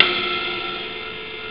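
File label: ride cymbal; jazz jackrabbit 1; M8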